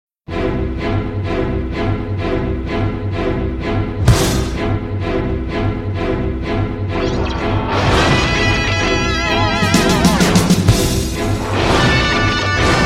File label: Instrumental